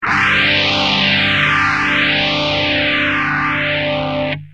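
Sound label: guitar; pt707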